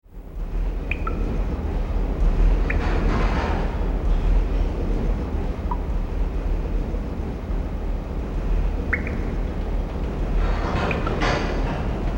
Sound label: Dark Ice Mines Underground Ambience; starfox